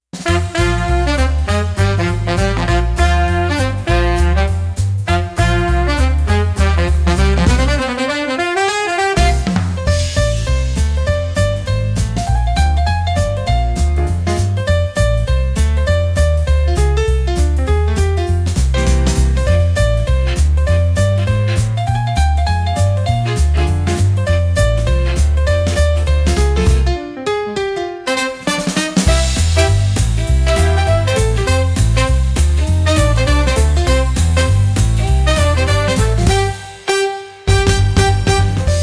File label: Brass big band swing